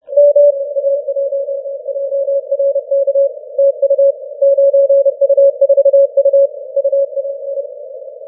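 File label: 40m Morse code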